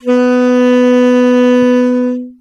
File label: saxo